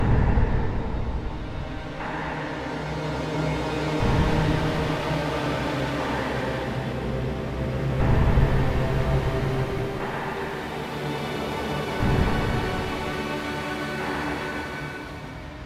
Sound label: Danger Tonal; Insanely Twisted Shadow Planet